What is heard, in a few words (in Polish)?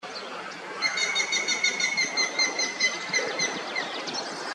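dzięcioł zielonosiwy; ptaki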